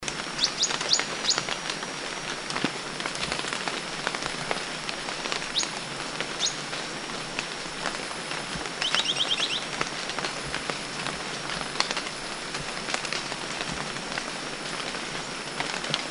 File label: Fork Tailed Sunbird Ssp Latouchii Song And Call; souimanga de christina aethopyga christinae ssp latouchii; Guangxi Da Yao Shan